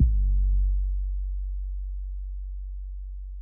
Not Nice 808's

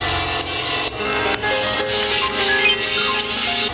Melody

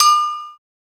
Perc

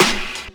Weird Snare

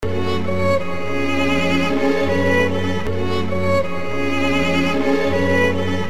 BPM 158

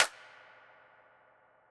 Clap

